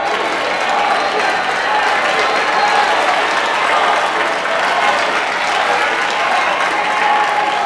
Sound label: duel crowd; Star Wars Knights Of The Old Republic I; XBOX